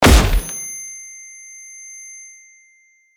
Flashbang explosion silent ring; bomb

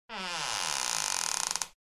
Door squeaking

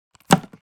Headphones set down; headphones and speakers

